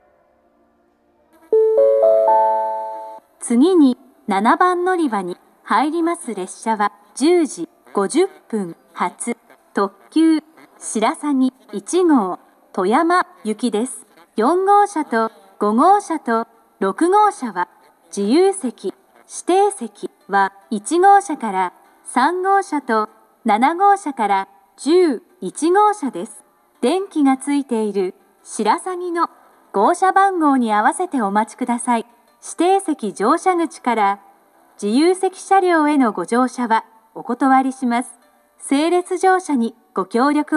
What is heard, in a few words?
kanazawa limited express; shirasagi1; toyama; jr-west